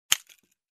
Click sound effect

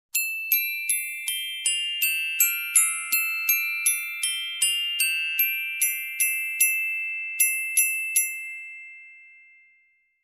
Descending glockenspiel melody

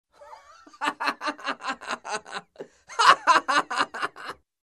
Man Hilariously Laughing

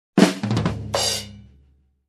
Short drum break groove

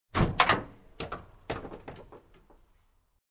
Opening compartment with handle

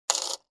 Coin hitting

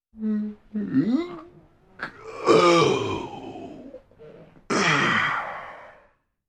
Stretching and Sigh of relief

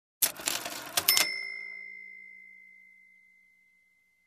Cash opening and cash register ting sound

